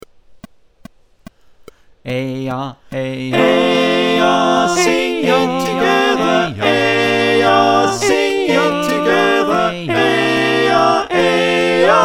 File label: SATB